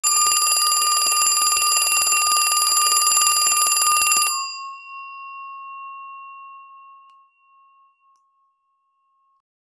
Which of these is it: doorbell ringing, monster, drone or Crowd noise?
doorbell ringing